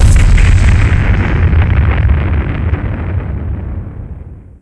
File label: Big Blast; destructions; ImpGal2; spacewar